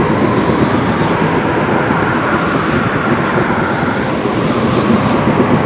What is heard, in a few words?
idle loop; Ancient Apparition